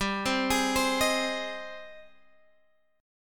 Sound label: Ab Major 7th G